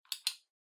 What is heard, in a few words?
Megaphone Hardware Push Switch